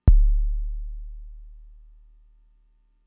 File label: kick, Fricke MFB-522